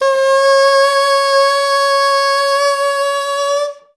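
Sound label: oboe C#4; Best Service; Peter Siedlaczek's Advanced Orchestra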